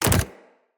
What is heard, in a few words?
companion land default, footsteps; Pets; Zanuka